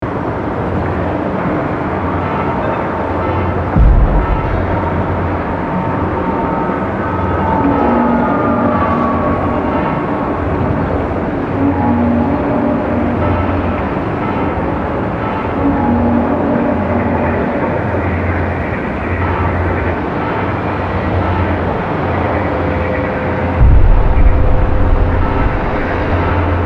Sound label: Amb City; Amb City Bladerunner